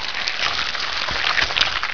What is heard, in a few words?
SLIME